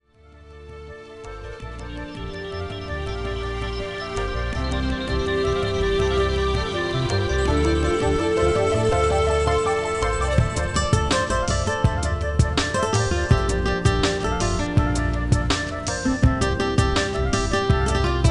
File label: Chillout Lounge Ambient